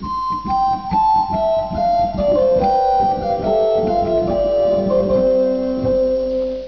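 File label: Blockfloete 4, organ; Martin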